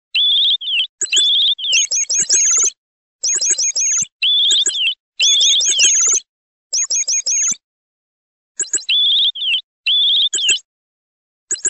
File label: Clock (Chirr), Nokia Tones; Windows Phone apps